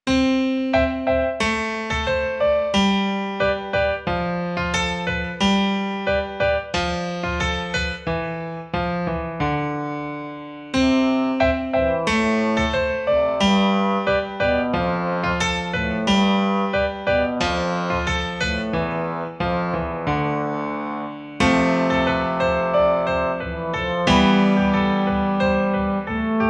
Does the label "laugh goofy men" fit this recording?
No